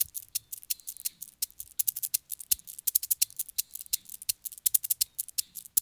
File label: 82.5 SHAKER